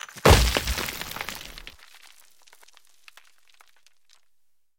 Stone Impact, Bei diesem Sound kollidiert der Stein mit der Säule. Hierbei hört man das Krachen des Steines auf die Säule, sowie das Zerbrechen der Säule